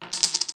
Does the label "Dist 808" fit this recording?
No